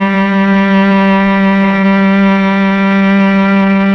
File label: MEL CEL I G 4, mello cello